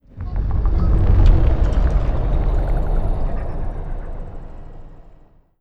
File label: star eater moving out; Stellaris; nemesis